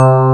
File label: EP Bass C2; Multisamples; Digital Sound Factory Xtreme Lead